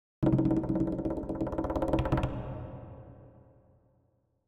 Drums Percussion Danger - Fast Drumming On A Medium Percussion; Hollywood Edge; sound designer's toolkit v2